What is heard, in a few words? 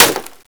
hit wood; smallhits